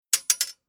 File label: Sword Old Single Hit Metal Impact And Bounce Rattle; Weapon